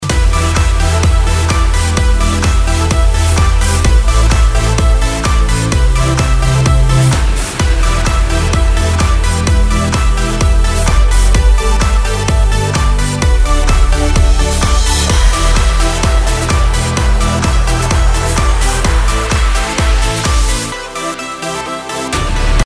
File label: Electronic Movement; Beats Fever; PS4 Windows gamerip 2017